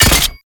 Scaldra Pistol Low Clip

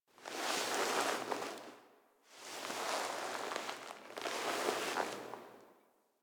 Queens Lair Foley Queen Grab Scepter; Warframe; The War Within

